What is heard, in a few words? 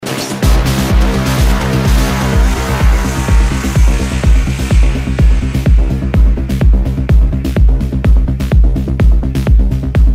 progressive techno house